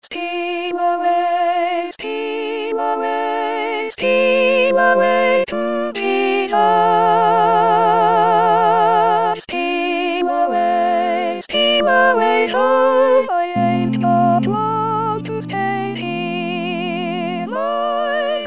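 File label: soprano; Steal away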